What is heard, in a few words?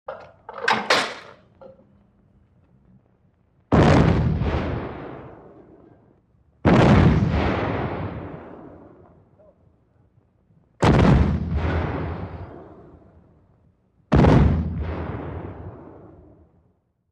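Cannon Multiple Shots, modern artillery exterior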